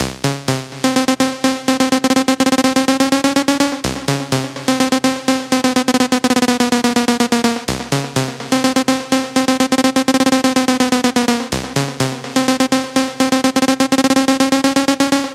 melodic techno

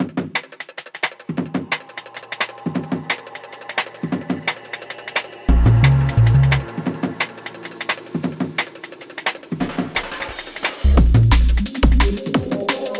Analog Dreams; bellatrix audio; analog dreams vol 2; modern serum

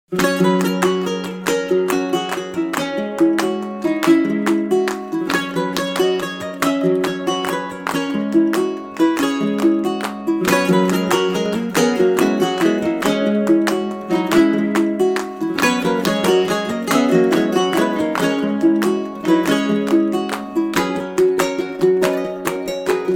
Baritone Ukulele; vanilla groove studios

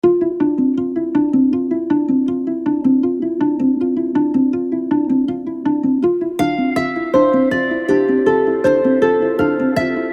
Celtic Harp; sonic collective

